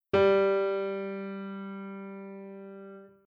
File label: tenor chromatic scale